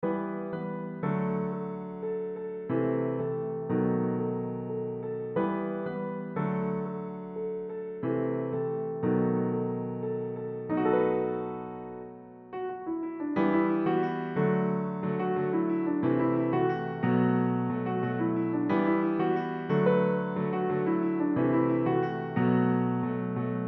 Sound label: Piano Solo; Fukrey